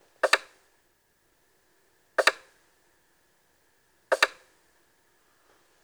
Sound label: Bedroom Hospital Device Loop; Cave; Ambience; Warframe; Stalker